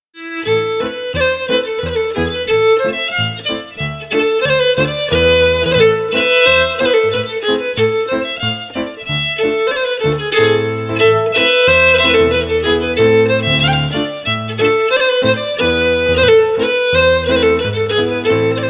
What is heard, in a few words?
Switching Fiddles, FIDDLE PIANO; Jink & Diddle Book o' Tunes 2022